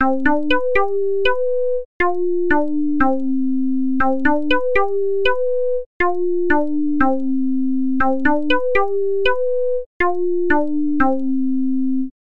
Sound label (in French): carillon